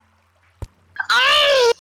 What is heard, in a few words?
aaaaaaaaaaaaugh